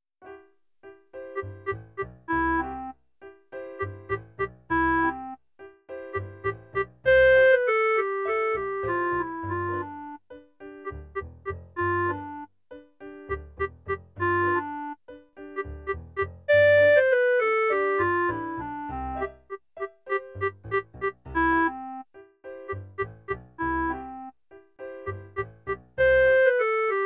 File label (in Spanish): CLARINETE